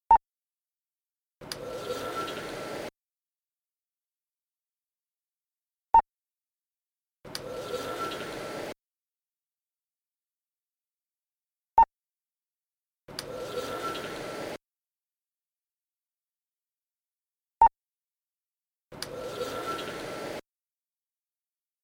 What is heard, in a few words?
techno